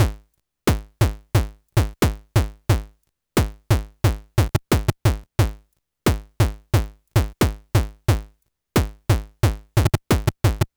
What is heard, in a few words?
kick